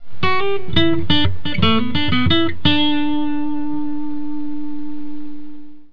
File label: vibrato, guitar